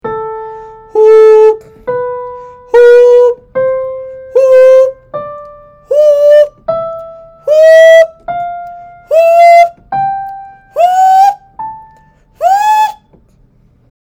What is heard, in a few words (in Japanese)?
後ろ上 裏声